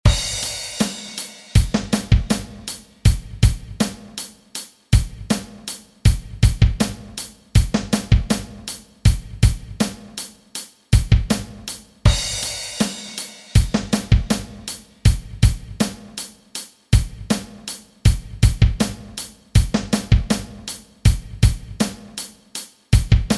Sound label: drums